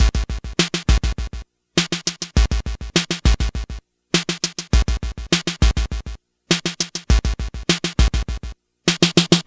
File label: Game Boy Break Loop FX, Drums